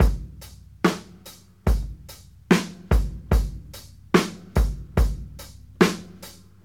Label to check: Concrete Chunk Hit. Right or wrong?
Wrong